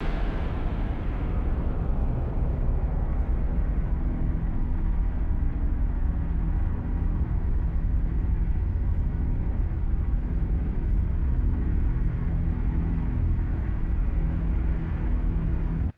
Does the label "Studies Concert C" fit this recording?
No